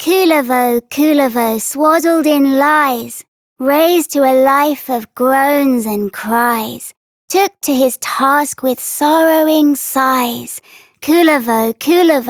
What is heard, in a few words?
Child F, Childrens Rhymes; Warframe; Duviri; Kullervo